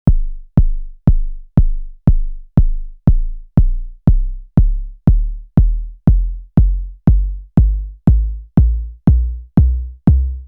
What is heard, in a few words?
Arturia DrumBrute Impact Kick